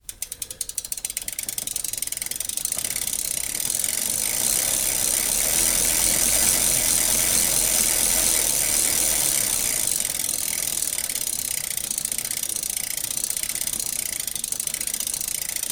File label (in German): freilauf shimano